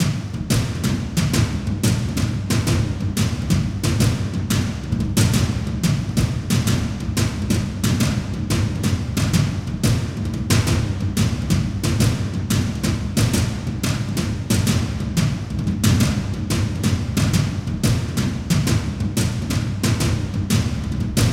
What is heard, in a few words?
Toms - 90BPM; Percussion; Ghosthack; SkyRealm; Percussion Kits; Kit 18 - Under Seige